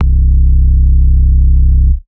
bass one shot synth sub fat glide drop C2

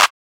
Clap

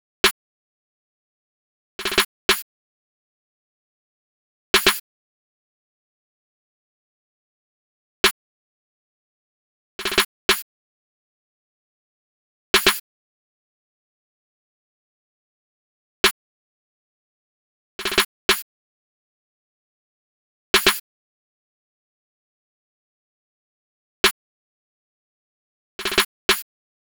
BPM60, Snare roll

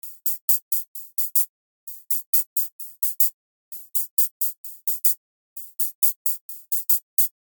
140 HIHAT

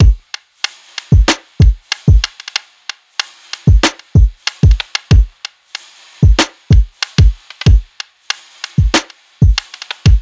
Drum Loop, Full, 94

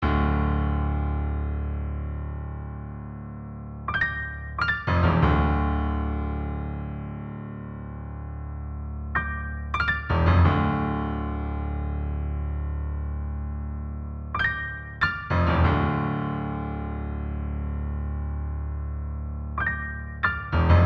piano Dmin

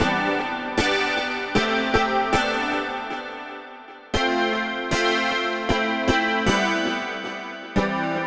Chords

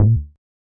Subbass A1; Rose

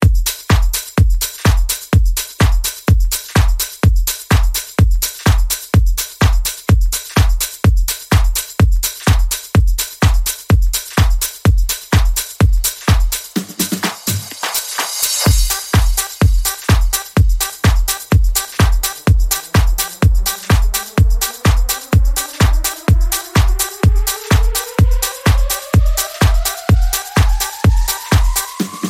Future House; Producer Essentials

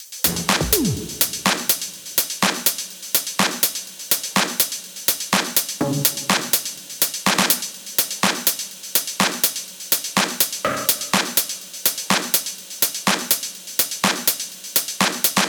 124 Drums Top

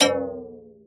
fx one shot game over

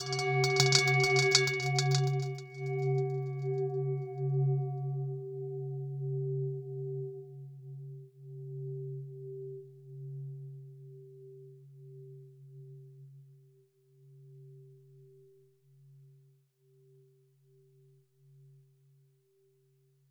C-m Singing Bowl

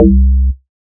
Bass C2; Bund

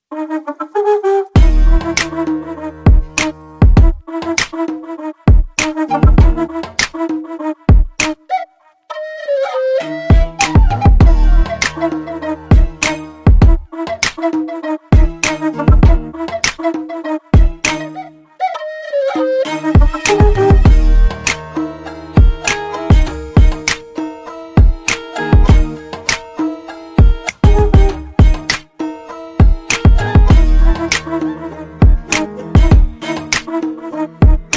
Ethnic Hip Hop Shots

